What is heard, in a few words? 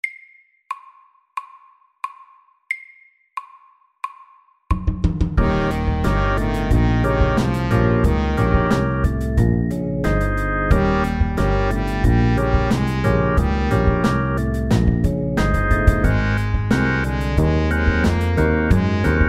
12 bar blues SLOW 90